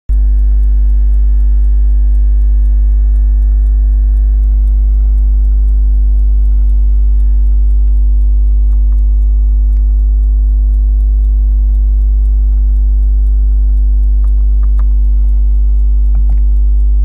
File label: watch sound